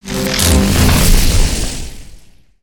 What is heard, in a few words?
Javelin Charge